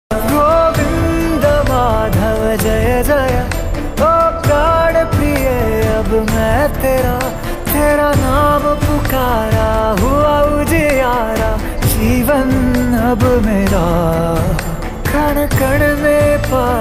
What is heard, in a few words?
ringtone telugu hindi; om namo bhagavate vasudevaya